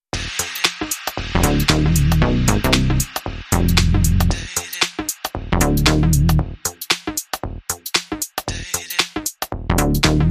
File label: Funky Train